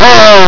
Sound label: laugh; altered beast